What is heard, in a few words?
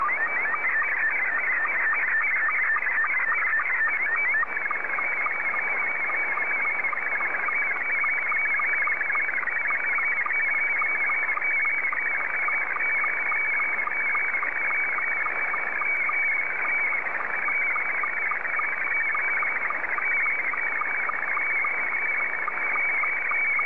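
SSTV, Scottie 2; JA3QJQ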